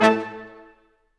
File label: BRS Quintet staccato, Symphony Orchestra